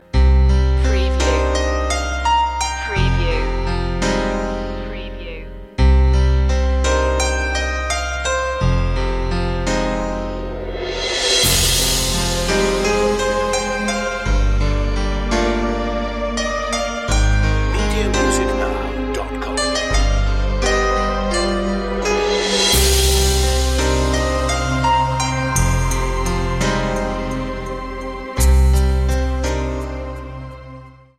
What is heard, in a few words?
sad piano